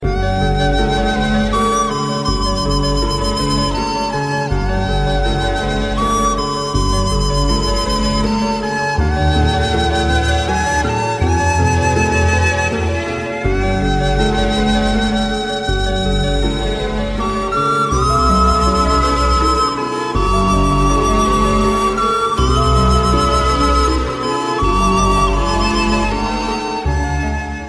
Angelic Relaxation

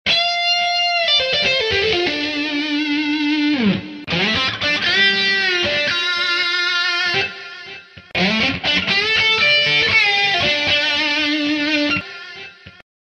Electric Guitar

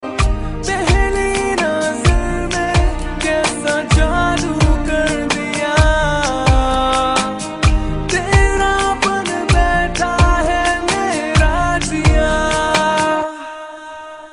Acoustic

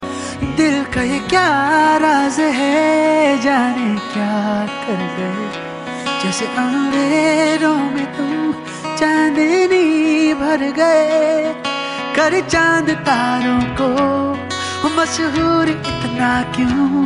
I Love You Acoustic

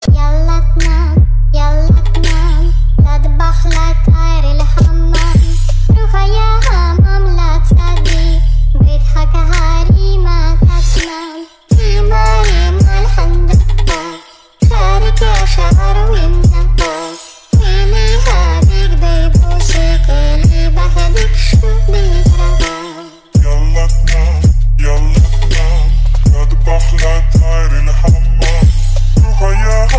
Arabic Trap

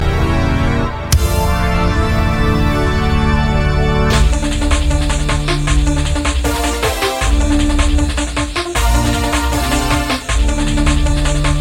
Boombass; Skyline

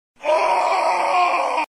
Horror Scream

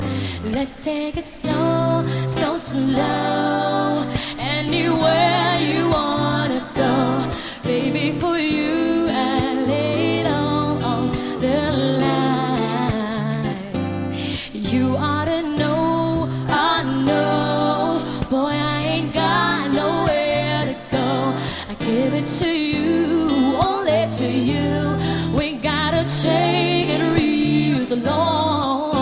So Slow

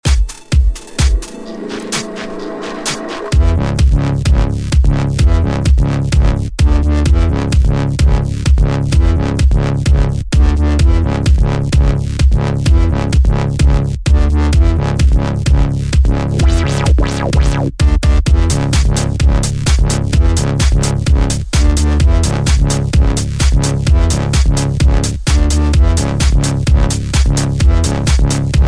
Dark Electro

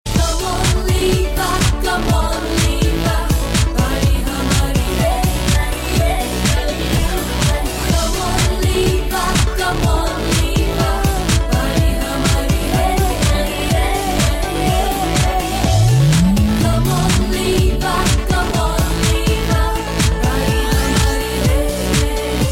Ad Music; Liva

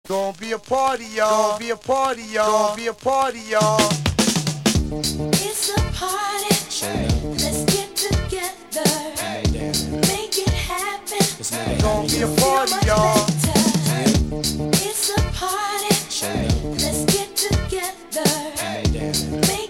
Dance Mixes

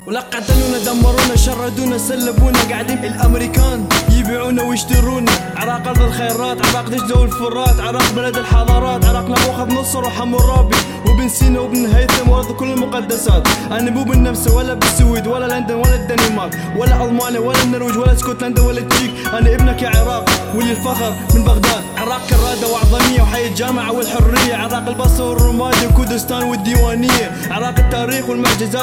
Arabic Rap

